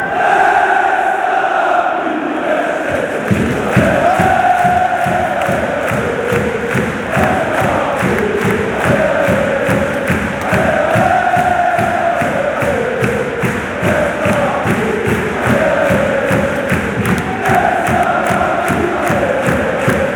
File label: allez allez allez, chants; rapid